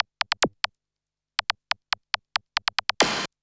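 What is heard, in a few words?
SNARE ELECTRO 140, Techno Drum; Techno2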